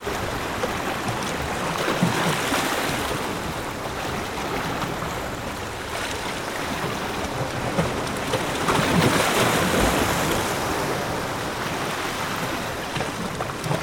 water ocean waves rocks light; sound effects one

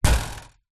impact fan basket head

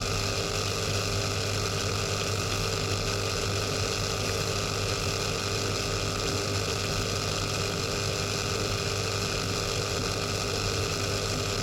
household swimming pool filter

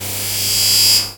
Spacecraft Shutter Open

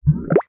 cartoon slime drip or bubble pop; zapsplat

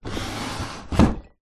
household drawer close chest of drawers

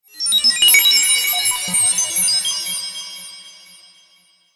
data teleportation twinkle delay; sound design; zapsplat

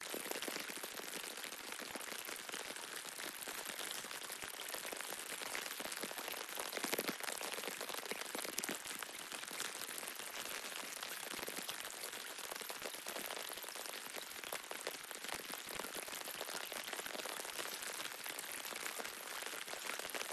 rain moderate watery drippy; PMSFX

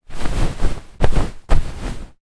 household duvet movements; zapsplat

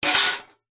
household baking tray metal impact drop

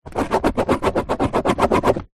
pen ball point write scribble on empty card box; office

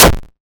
video game retro 8bit gunshot

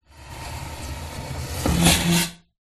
household chair dining slide tiled floor

